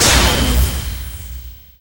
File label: Magic Spell Impact